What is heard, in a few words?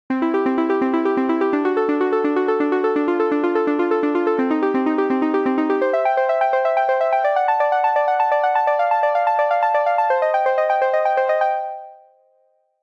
Subsynth arpeggios